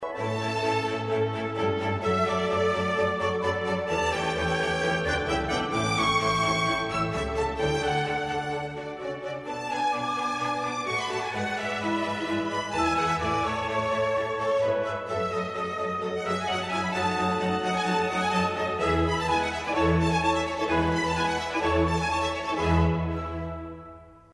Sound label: piano concerto a major; mozart; k488